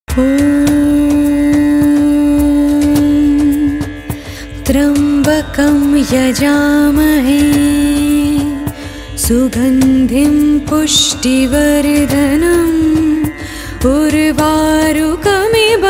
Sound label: Mahamrityunjay Mantra Ringtone